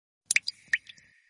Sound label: short message tone